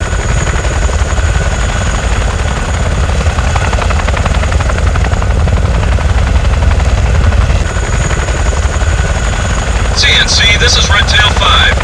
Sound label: helip intro down